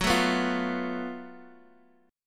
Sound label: F sharp Minor Major 7th Double Flat 5th, Guitar Open D; chordshape; down